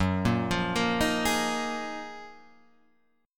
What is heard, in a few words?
F sharp Major 13th, Guitar, Standard; arpeggiated; JGuitar